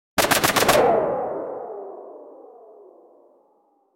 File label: distant assault riffle, gunshot